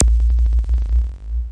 bass copter

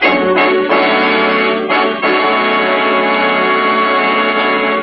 oldtime suspense, orchestral and classical

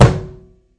Microwave Hit; ModeAudio Found Percussion Hits

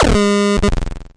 SH101 Tone

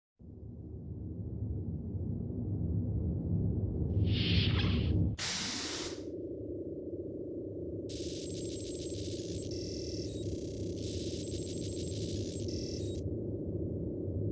ambient journey; Computery or Science Fiction; startrek

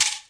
shaker; Bunch of Shakers

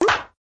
vintage snap sudden; Cartoon Sound Effects Kit

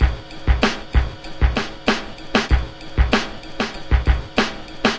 96 bpm dead kicker, drum loops and breaks; 091 to 100 bpm

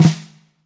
real; REAL LIVE KITS; A Real Freakin Drum Kit

